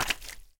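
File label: walking in boots through a swamp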